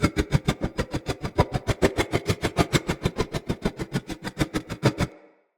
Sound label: Cleaver Throw Loop, Swords; Weapons; Player; Bromius Cleaver